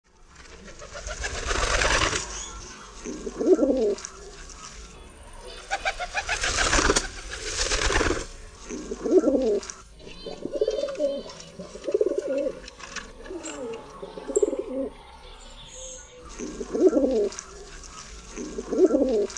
golab miejski

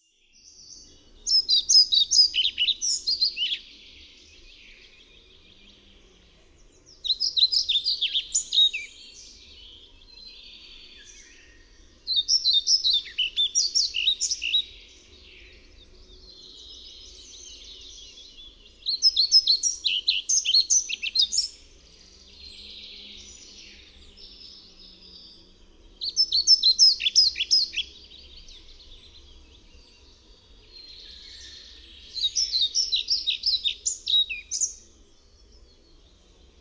MUCHOLOWKA ZALOBNA

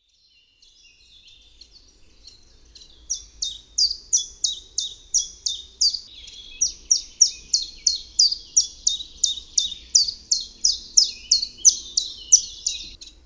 PIERWIOSNEK, birdsounds

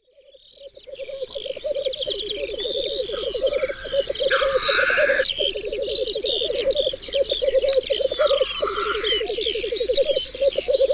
cietrzew, birdsounds

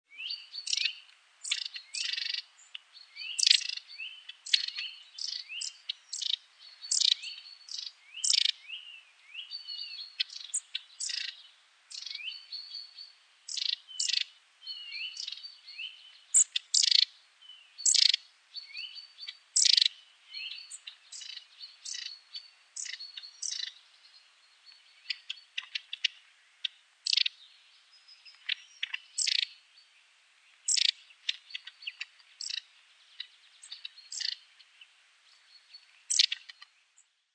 RANIUSZEK, birdsounds

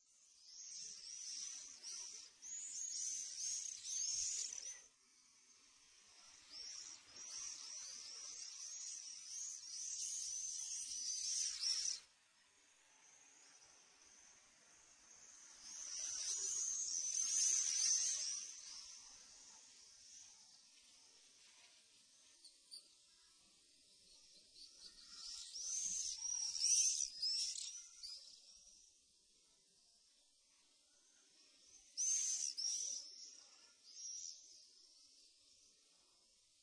jerzyk, birdsounds

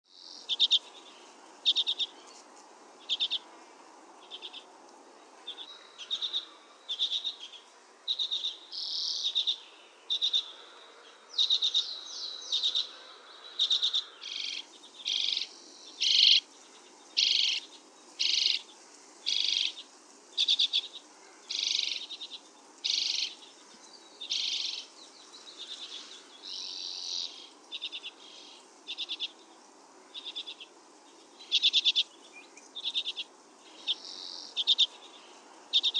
CZECZOTKA, birdsounds

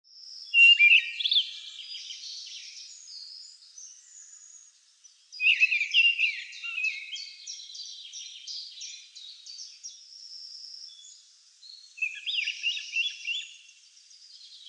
PASZKOT, birdsounds

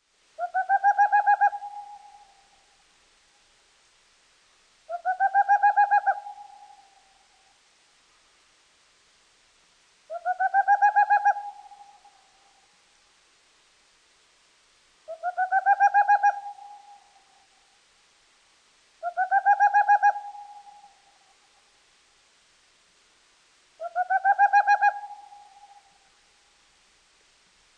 wlochatka, birdsounds

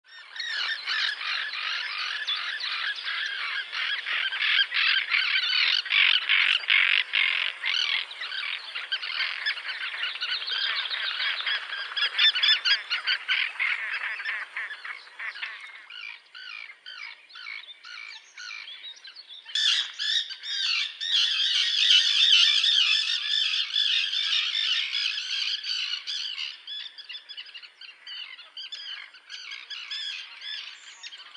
rybitwa rzeczna

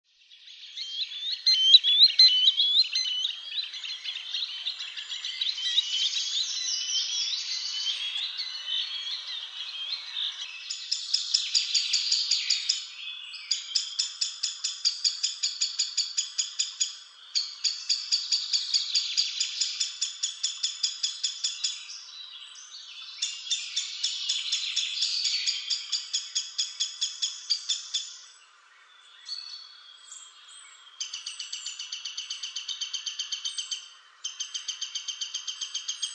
samotnik

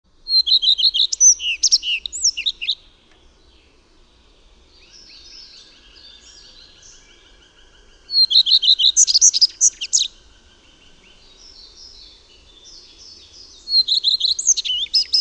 PLESZKA, birdsounds

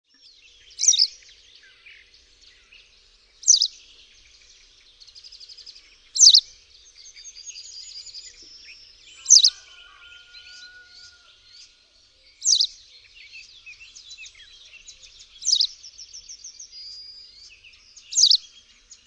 PLISZKA SIWA, birdsounds

